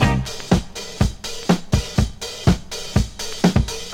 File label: 122 bpm drum loop sample c key, kick tuned to the C note